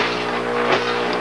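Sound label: Jacob's ladder; 12VDC in; purely AC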